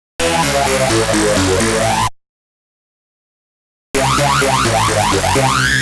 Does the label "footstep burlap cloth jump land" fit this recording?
No